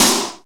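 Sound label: snare drum; East West; Global Sounds; RY30 DRUMS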